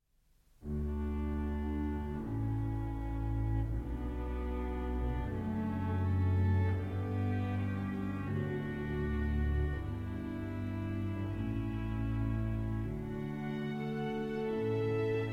Canon and Gigue in D Major for 3 Violins and Continuo; P. 37; I. Canon (Orchestral Version)